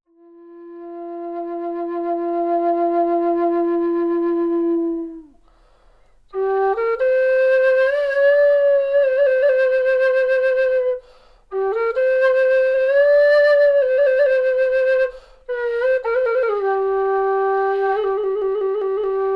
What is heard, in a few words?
Duda Sopilka chromatic tenor flute; handmade